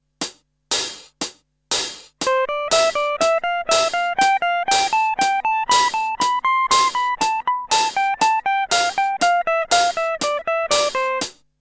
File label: finger medium